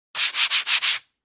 scratch

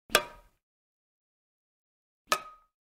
badminton

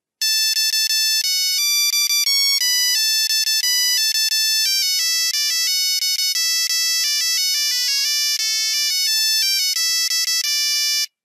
nokia 3310; zvuk nokia 3310

